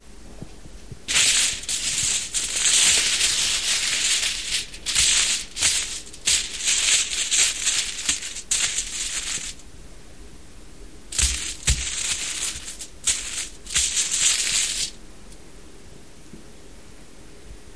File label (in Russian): olovyannaya folga zvuk